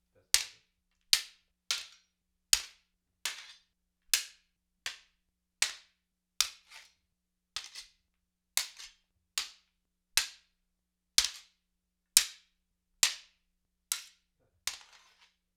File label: Sabre Katana Blade on Blade, Weapon on Weapon; StillNorthMedia